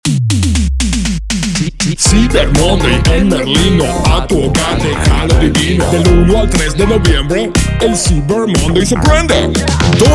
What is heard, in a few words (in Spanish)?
jingle; Merlino CyberMonday